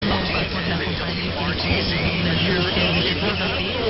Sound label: Roanoke VA; 1410 WRTZ